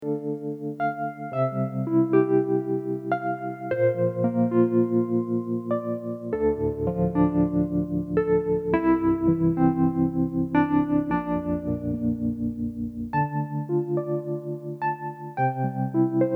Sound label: Psychedelic Wurly clean distorted; Behringer 646